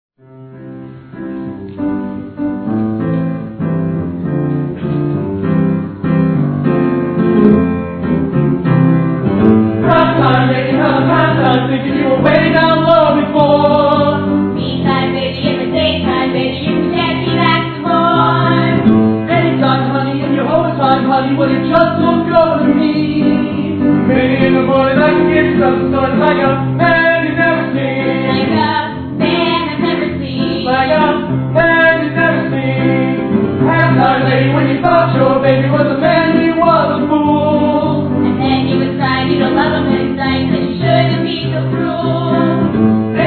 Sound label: Rap Time Live